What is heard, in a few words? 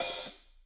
HH, Drums; Bob Clearmountain Drums I